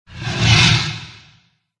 dragon blue attack voice middle

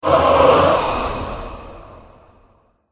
angel death

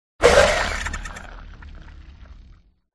worm attack voice up

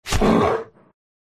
Bear Wound

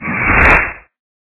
rock golem attack up

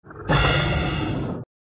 Dark Spirit

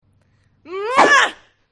bot human female attack voice up

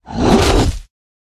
troll attack middle

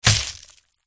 flower attack contact bottom

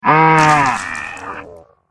death

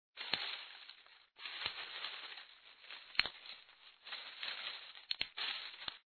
mandragora idle

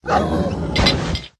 dog death

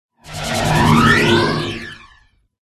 tiger buff in

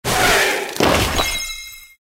Lizard

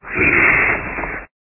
bat attack

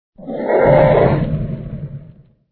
fire death; krofdor

